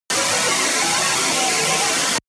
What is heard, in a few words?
sci-fi digital transmission noise